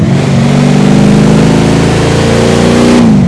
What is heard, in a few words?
third, vehicles